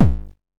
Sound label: verby bass drum single shot g sharp key